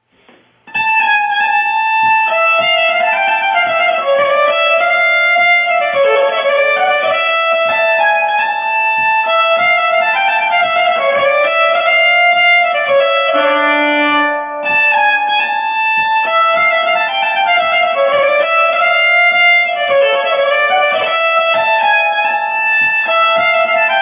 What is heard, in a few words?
Polska Dalarna La, nyckelharpa